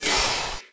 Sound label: drop; nzp